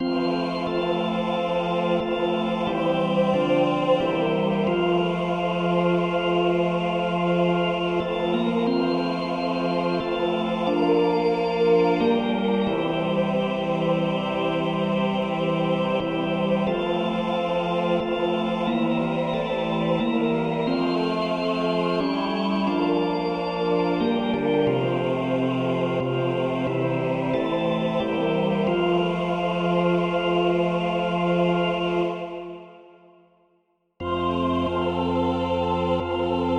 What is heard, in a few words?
polyinstru; hymns; O Lord a few of Thine